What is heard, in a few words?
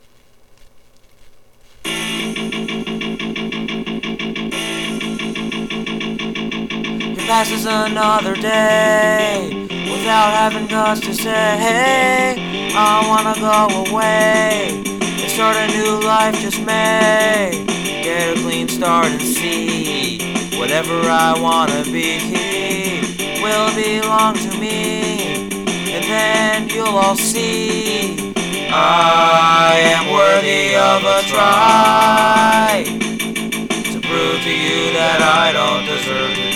late night rock